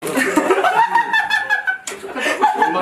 Laugh; Wooyoung

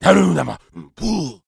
Yell; Nazeebo

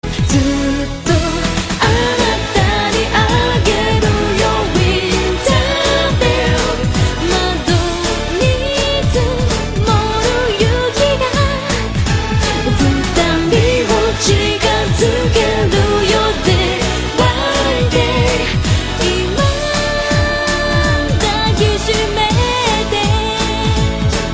Winter Bells

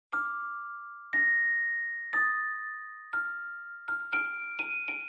Music Box

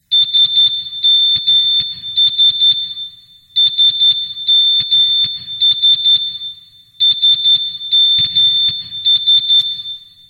Morse Sms

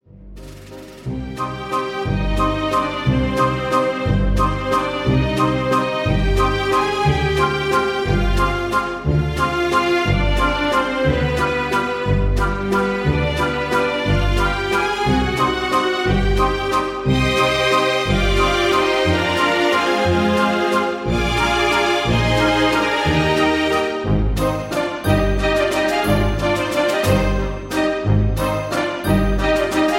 Waltz

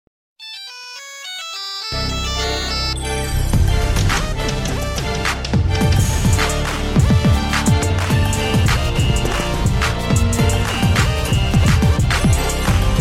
New Nokia Hiphop

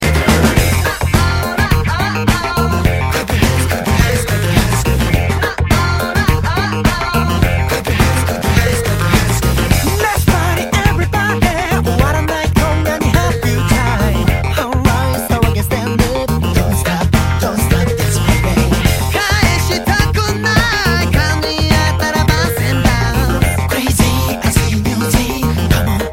Clap Remix

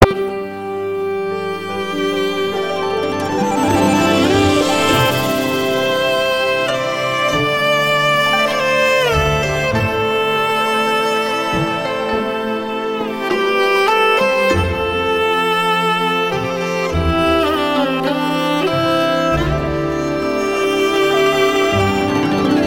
Soft Violin

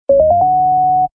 Short Beep Fade

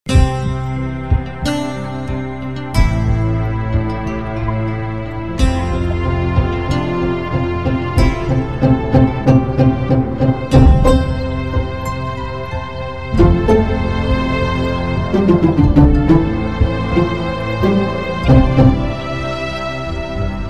Tone; Titan

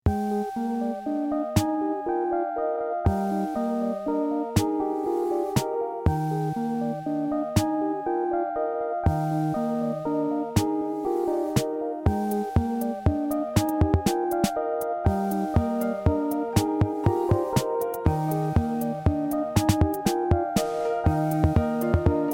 Bad Dream Music

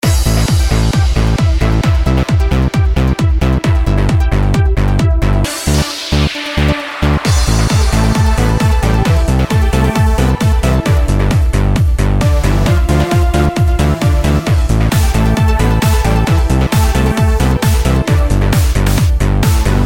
Dance Disco Song

